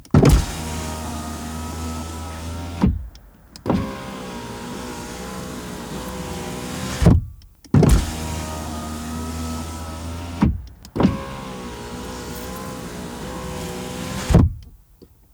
audi a4 allroad 2.0 TFSI foley window interior open close; mono NTG3; SoundHolder